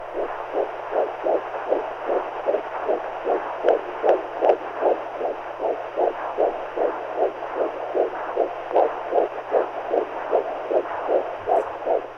Heartbeat

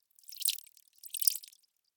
Drippy

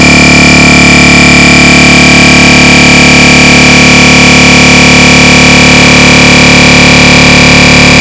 Noise

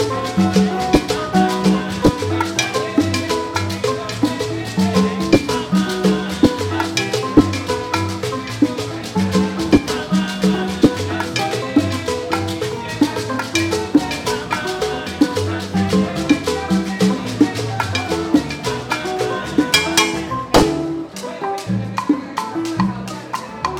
Live Band In Havana

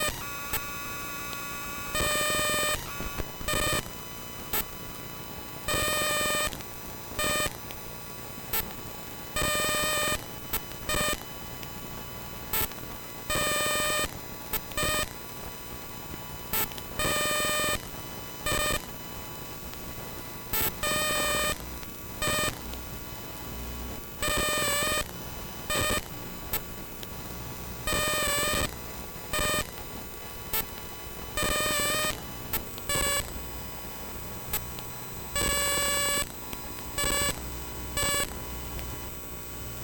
Strange Digital Noise

